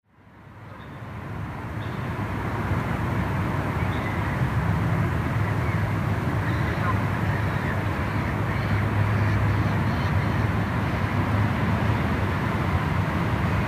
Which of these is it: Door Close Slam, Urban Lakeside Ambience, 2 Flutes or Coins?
Urban Lakeside Ambience